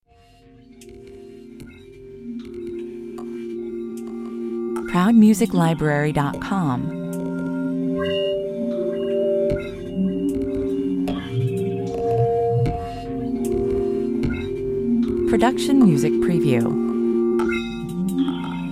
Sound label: noise room 153 bpm